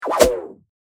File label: pound